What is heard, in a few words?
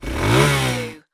Motorcycle Dirt Bike Rev